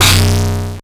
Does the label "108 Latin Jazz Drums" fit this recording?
No